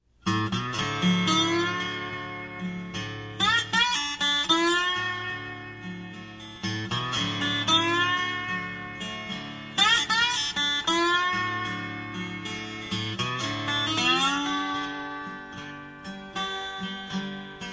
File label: cotton blues